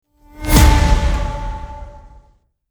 Cinematic, transition

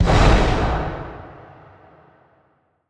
bomb explosion in battle

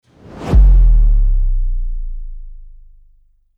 Subdrop, transition

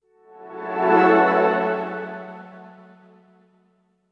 Ambient, transition

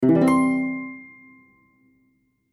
Cartoon, transition

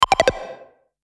Notifications and Buttons

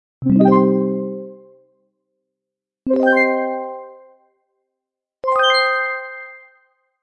arpeggio

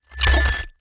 Glitch, noise

